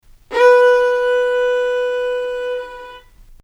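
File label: violin long gentle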